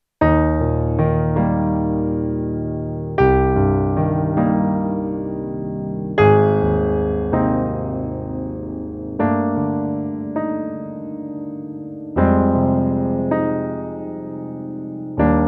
Korg B2 Piano

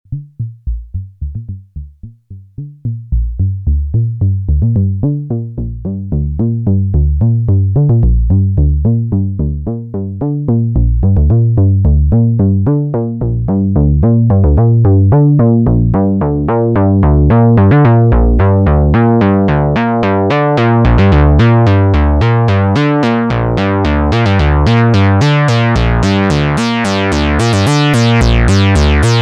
MicroMonsta 2 Funk Bass

